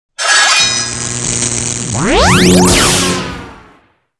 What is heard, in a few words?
sparkplug